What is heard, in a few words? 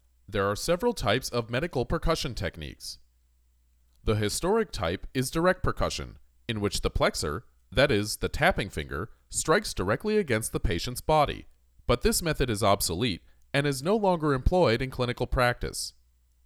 Percussion